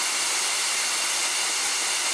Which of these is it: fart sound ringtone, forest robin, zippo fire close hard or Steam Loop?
Steam Loop